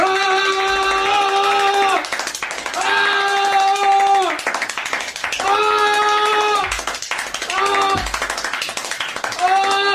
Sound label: sus clapping